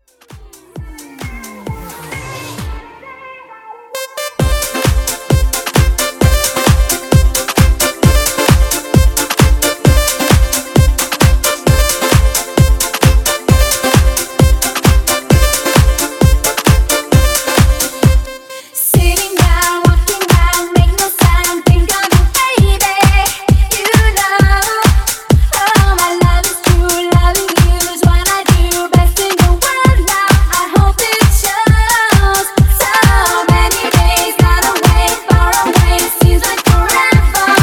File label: 90s Disco Re Drum 132 BPM